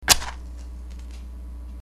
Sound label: match strike